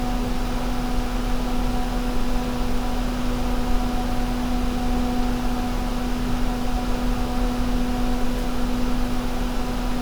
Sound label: Max RPM